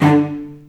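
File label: D2 arco short cello, left; East West; The Ultimate String Collection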